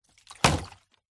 foley bottle plastic full soda water drop or knock over hard surface; zapsplat